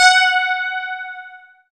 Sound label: GUI CLEAN, E-Guitar; E-GUITARS